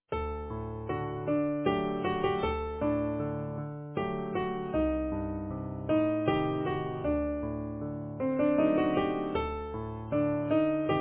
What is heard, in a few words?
easy piano